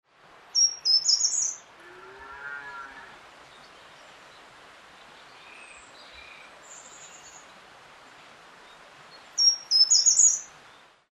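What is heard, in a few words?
short toed treecreeper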